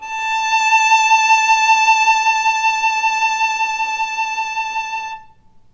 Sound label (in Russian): skripka arko vibrato odinochnii a 4 ponizhennii